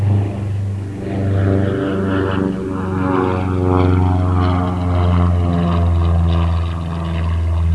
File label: plane